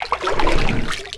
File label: gurp; vortex; qbot